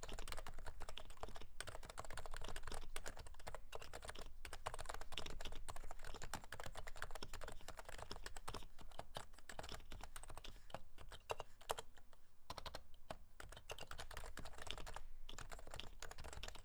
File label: Realforce PFU Limited Edition R2